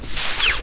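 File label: Material friction reverse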